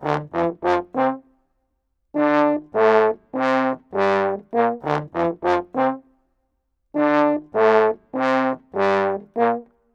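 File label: 100 D minor, brass; 90 s Sample CDs; PowerFX - Downtown Orchestra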